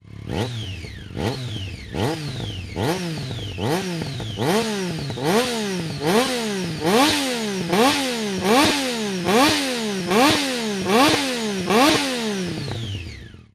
remus hyper cone slip on exhaust triumph street triple r